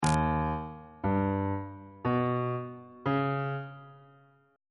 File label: e flat augmented 7th chord intervals on bass clef